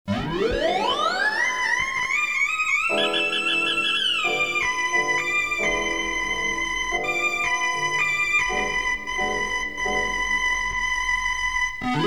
Coded Music Apparatus Patterns On The Pitch Graph Automated Sacbut; lecaine; Compositions Demonstrations; Le-Caine Hugh